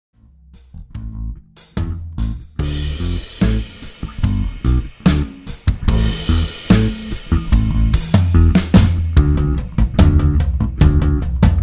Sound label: No Voice Guitar